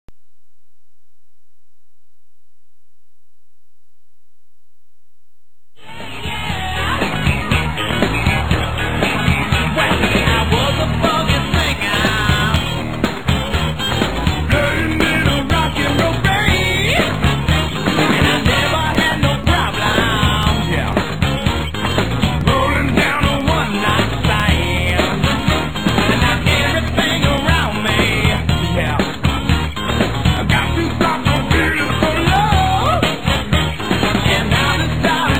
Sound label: funky music